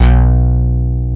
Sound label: DANCE BASS; East West; Global Sounds; DIGIBASS